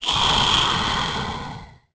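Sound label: scream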